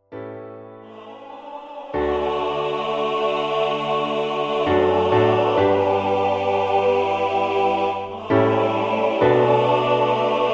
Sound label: MIDI SATB